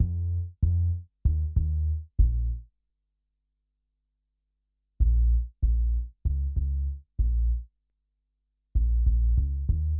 BASS, viral hiphop

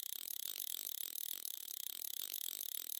spin

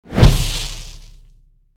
impact; go diego go; safari

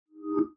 upload; zara

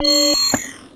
radar zoom on target

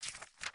paper about down

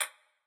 Bullet Bounce

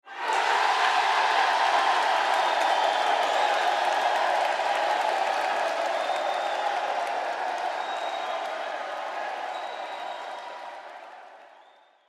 crowd Reaction arena big Reveal; spellbound

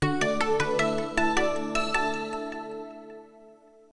sound Fantasy Win

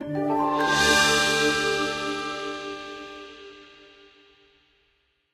fanfare; waygate upgrade

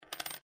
coin down

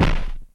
kick

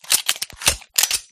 reload, guns; resurviv

